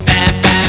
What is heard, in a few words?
baa baa